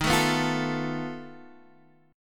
F 7th Flat 5th Eb

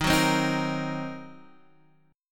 F sharp 6th Flat 5th, chord; x,6,4,5,4,6

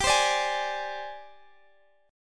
Ab Major 7th Flat 5th G, Guitar

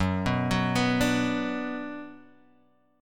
F sharp Suspended 4th Sharp 5th, Guitar, Open D; 4,2,4,5,5,x; down

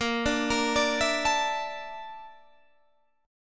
A sharp Major 7th Flat 5th, Guitar Standard; arpeggiated; capo 3; 18,17,20,19,17,17

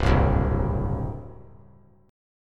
C Suspended 2nd Flat 5th C down; Csus2b5 strummed; Csus2b5 chord

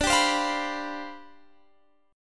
C Major 7th Suspended 2nd Suspended 4th D, chord

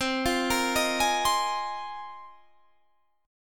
A sharp 7th Suspended 2nd Suspended 4th

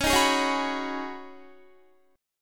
Db 7th Suspended 2nd Suspended 4th, Guitar; 21,18,18,18,18,18; Db7sus2sus4 strummed

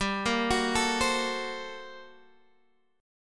G Minor Major 11th, G

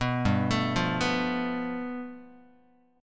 G 9th, Guitar; arpeggiated

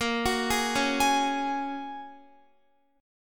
Bb Minor 7th Sharp 5th, Guitar; Bbm7#5 arpeggiated

